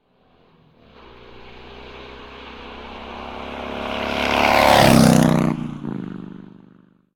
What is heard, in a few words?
car; Blind Drive